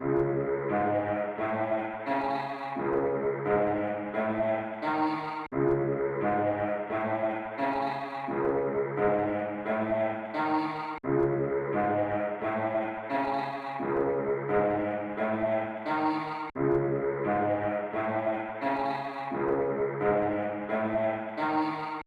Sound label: 87 BPM C# Min Brass, melody loop stem; Trap; Loop Stems; Cymatics; Circus; 2022 Melody Collection